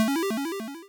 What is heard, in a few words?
achievement unlock